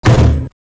Table Hit; SFX

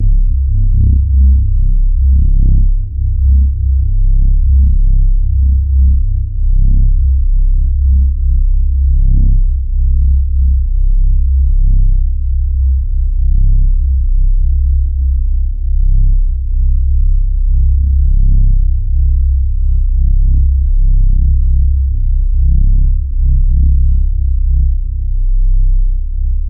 Reese Bass; 808

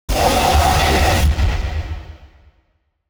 Screams Of Hell; SFX; Experimental - Other

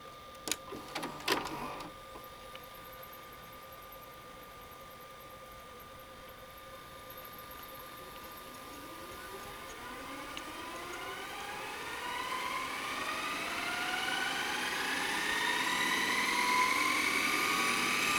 VCR Fast Reverse; SFX; IRL Recorded

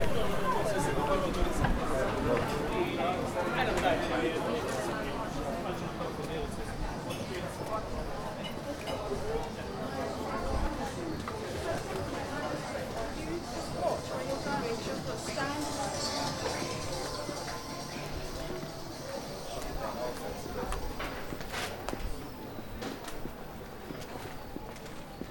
Busy Streets; SFX; IRL Recorded

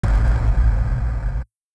Nuked Radiation, Impacts - Bass; SFX

